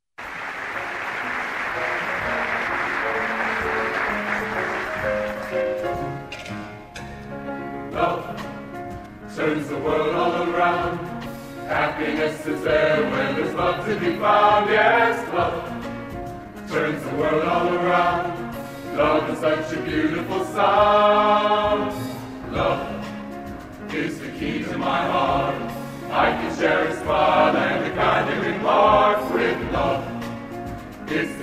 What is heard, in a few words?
Chorale